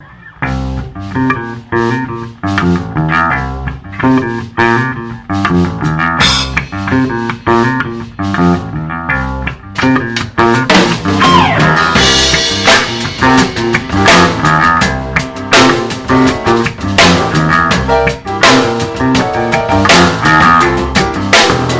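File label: Fender P Bass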